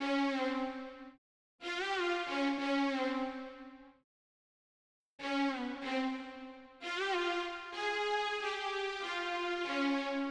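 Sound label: High Strings, Hip Hop Exotica, A minor; Big Fish Audio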